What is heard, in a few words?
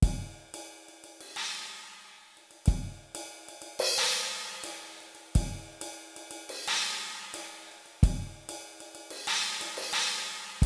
centered cymbals 90